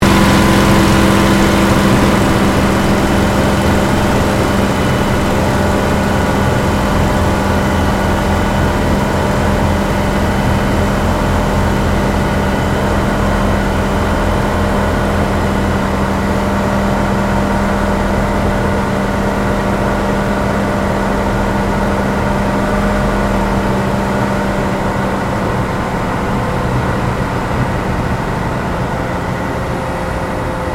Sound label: AIRCRAFT SUPERMARINE SPITFIRE MK-VIII APPROACH INTERIOR